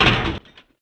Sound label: Drop metal